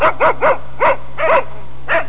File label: Two dogs barking in the distance